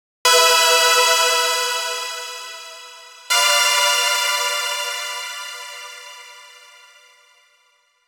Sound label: futuristic synth stinger